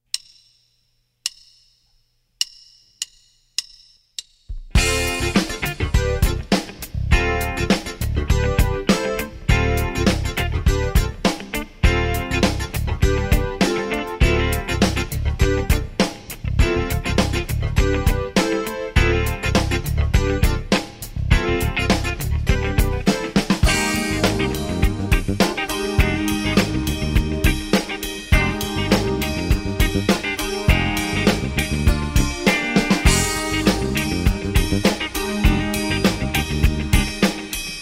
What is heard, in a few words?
with drums